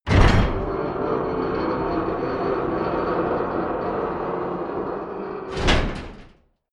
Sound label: gate metal medium open